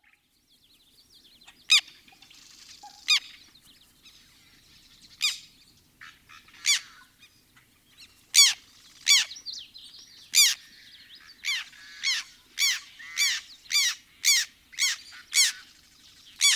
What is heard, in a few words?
Black Tern